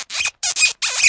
ear cleaning squeaks

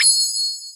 anime magic sound effect

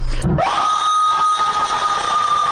death scream; bloodbath 98

